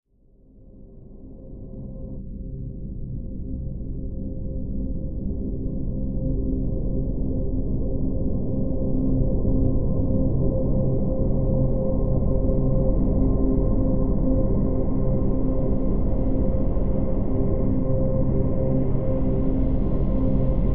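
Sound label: drone C; Drn Delusion C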